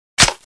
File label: clip in, weapons